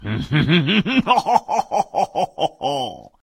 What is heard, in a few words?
Evil Laugh